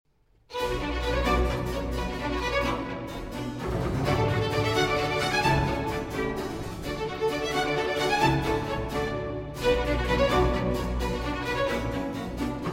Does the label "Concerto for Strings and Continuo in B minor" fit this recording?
Yes